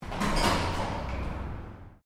Door Metal Glass Heavy Open Stereo, Heavy aluminium and glass door